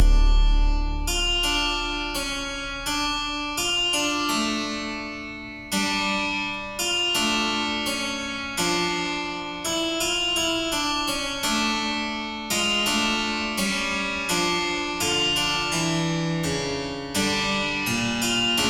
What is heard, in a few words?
Theme Tests Harpsichord; Sea Salt OST (2020)